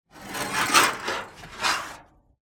Just Metal Scrapes and Scratches; soundbits

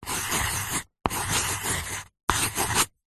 office pencil drawing on paper; zapsplat

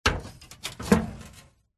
bbq metal lid open; household

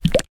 baby lotion movement sudden in bottle

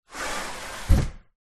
household window slide down close; zapsplat

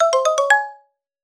app alert tone ringtone

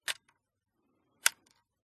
camera crank pull and collapse; smena symbol; mkh8060; pmsfx

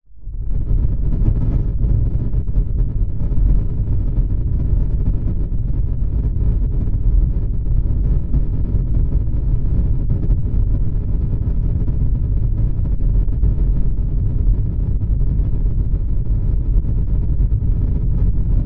nature fire flame large burst

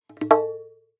Selection - Percussionist Affirm Selection; Sound Ex Machina

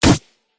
weapon gun gunshot futuristic; PMSFX

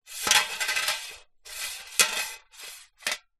money coins x2 inside metal tin roll; foley

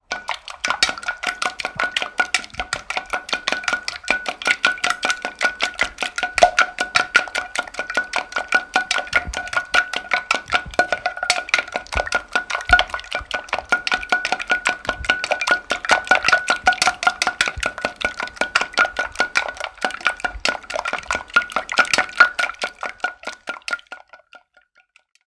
food beating eggs; sound effects one

